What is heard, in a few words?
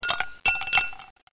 ICE